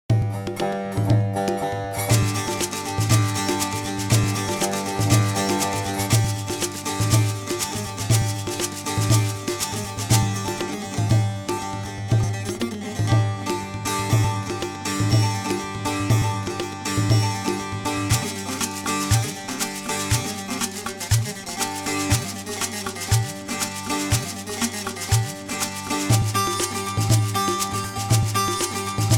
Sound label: Ethnic Music; World